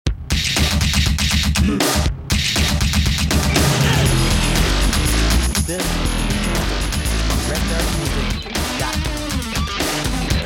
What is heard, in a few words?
Metal